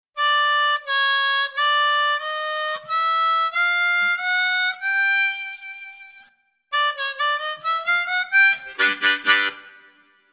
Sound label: Overblow, harmonica